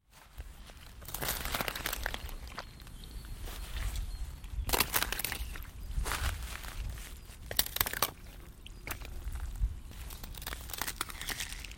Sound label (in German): Winterklang